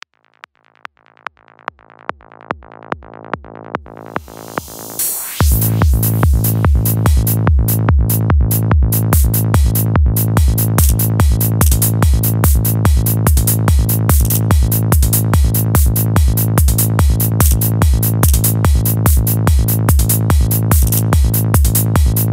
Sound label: bassline